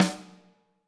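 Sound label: snare drum, Drums; Bob Clearmountain Drums I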